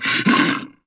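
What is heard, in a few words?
Bear Attack Vox; Civ4 warlords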